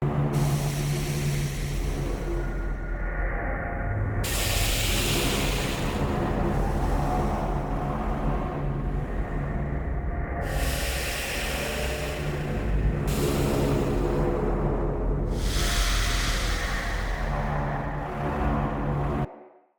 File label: Interior Loop; Encounters; Ode Pollution Tower